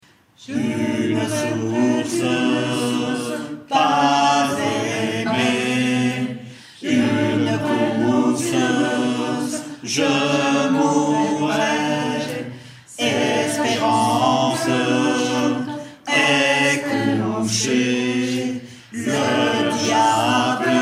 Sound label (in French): basse; Diabolo